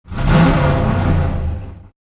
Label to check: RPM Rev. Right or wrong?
Right